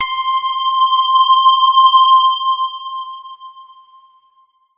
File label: Roland D-20 Hollow Koto C6